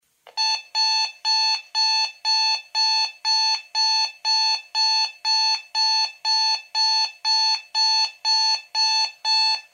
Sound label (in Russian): zvuk budilnik na elektronnyh chasah